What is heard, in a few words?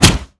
Aircrafts Engines Packard Merlin Prop; DCS